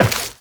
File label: Step Metal, Footsteps, Walk; Warframe; Tenno